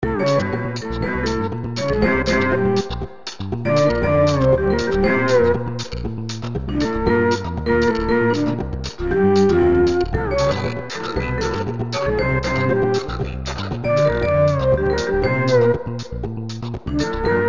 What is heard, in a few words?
drum